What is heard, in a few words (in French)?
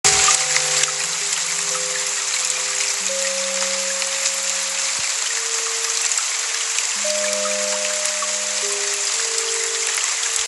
eau zen; Etude détaillée des acouphènes